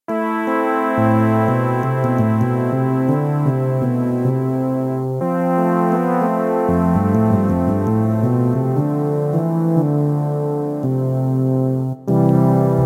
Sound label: Prophet Pad; Kawai K1; Bank 2 card A